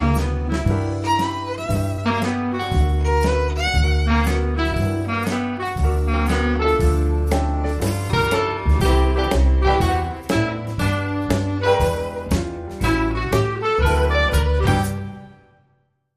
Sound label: Jazzy Glide